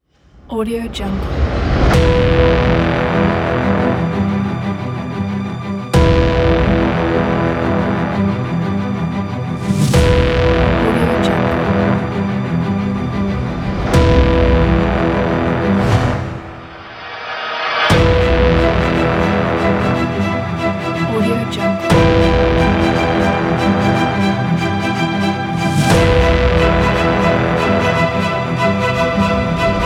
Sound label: epic trailer ident